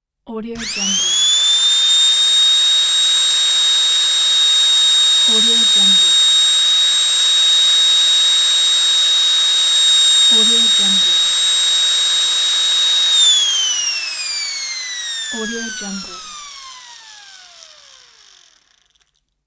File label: wood drilling; industrial sounds